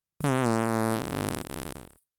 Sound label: fart; vegan